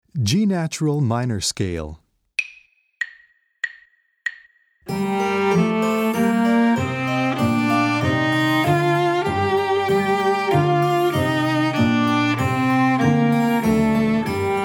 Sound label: G Natural Minor Scale